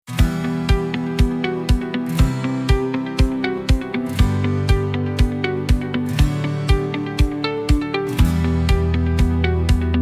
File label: thunder rope riff